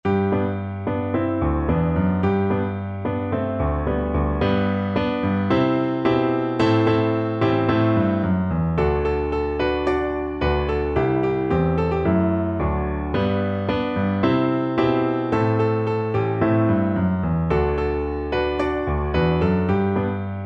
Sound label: taba naba viola